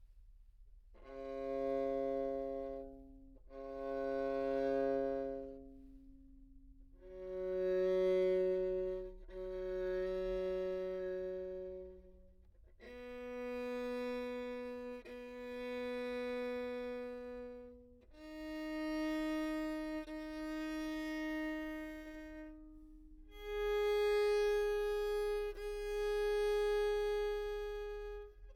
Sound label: open, treble viol back microphone, Anechoic